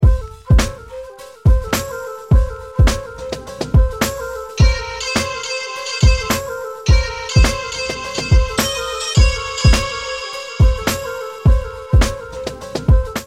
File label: hiphop